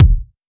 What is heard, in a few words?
kick drum d sharp key; kick drum samples; one shots